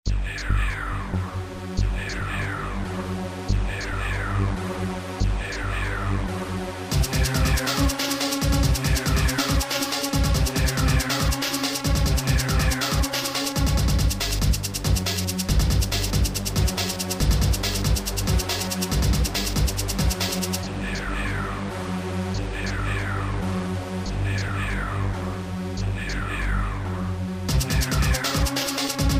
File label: horror